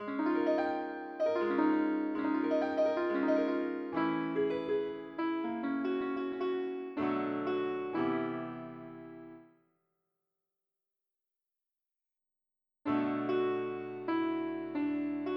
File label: piano; midi